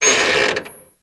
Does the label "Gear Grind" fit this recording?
Yes